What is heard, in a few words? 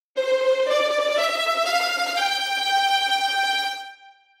tremolo